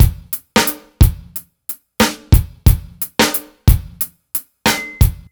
Dry Funk Kit, Drums Beats

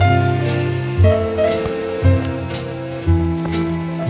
Synth Melody; Eclectic Electronica

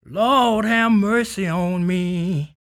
SING, Blues Soul; Spectrasonics Vocal Planet

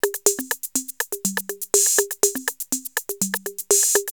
808 Intro 122, drumloops, DrumMachines; X-Static Goldmine

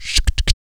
VOC PERC, Vocal Planet Jazz FX BASS PERC

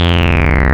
Saw Sync, wavetables; Animoog

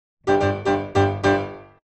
105 Cmaj SUGARFUNK PIANO; 105 BPM

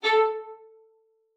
A4, VIOLIN SPICC; Xsample VOL-5

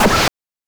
BANK2 SFX, NES DPCM; MIDINES

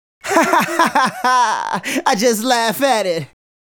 Male Solo, Hip-Hop and RnB Vocals

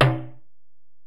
Ac Perc, Drum Machines Alesis DM-PRO

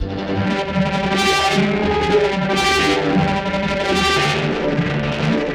Synth, Drum n Bass; KJ Sawka - Drum n Bass & Beyond; Synth & Melody

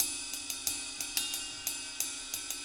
Ride Riff, cymbals; Sonic Foundry (Sony Creative Software); Joe Vitale Latin Percussion

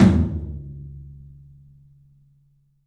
3-D Percussives, LOW Toms; E-MU Producer Series Vol. 5 – 3-D Audio Collection

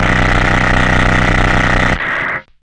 Car Trash, Hard & Loud Techno; USB Soundscan vol.01; AKAI

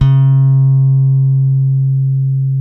PRECISION BS, Bass, D 4; Spectrasonics; Bass Legends

